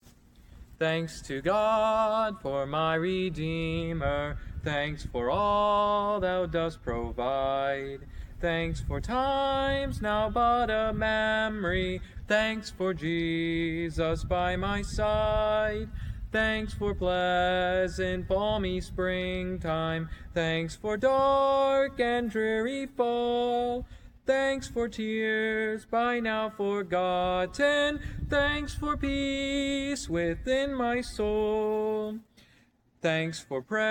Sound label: sung by a thankful man; Thanks to God; hymn